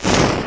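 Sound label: torch out; The Elder Scrolls III - Morrowind; Morrowind GOTY GOG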